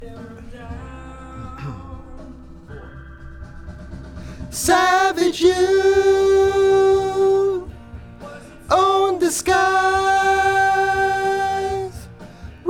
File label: VOX Back